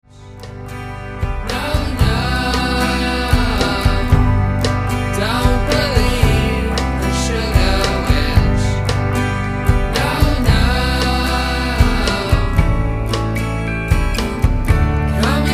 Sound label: drum